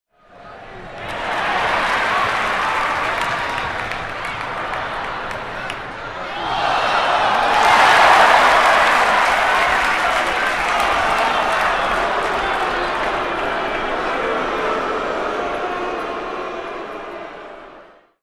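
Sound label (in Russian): баскетбол овации болельщиков и недовольные возгласы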